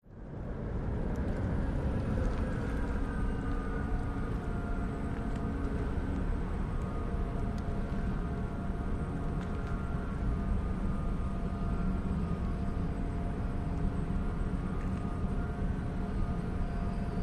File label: Ferry Boat Slow Docki